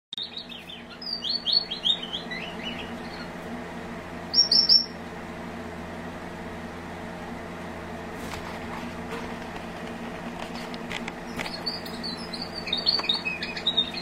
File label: strawberry finch sound